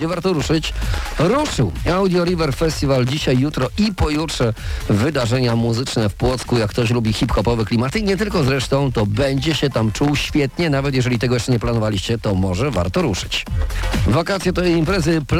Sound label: hiphop